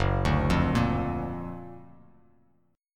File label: F sharp Augmented Major 7th; arpeggiated; JGuitar